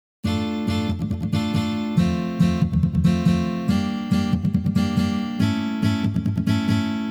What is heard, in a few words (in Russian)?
boj